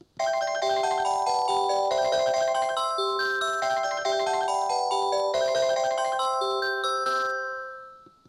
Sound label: samsung sgh x530 basic bell